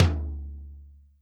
Roland R-8 Dry Tom 1

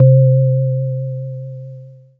Casio CZ-5000 Vibraphone C3

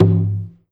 Roland PN D10 03 Japanese Drums

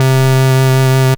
Casio MT 600 Double Reed C3

